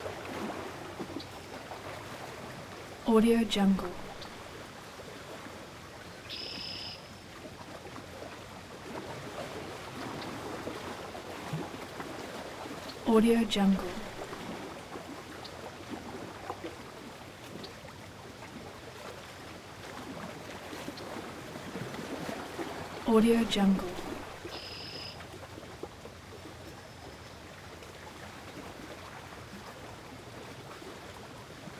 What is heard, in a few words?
ambience ohio lake erie shore waves birds june am